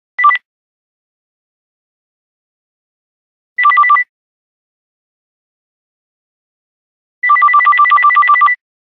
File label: 9210, Nokia Buzzer; Polite; Windows Phone apps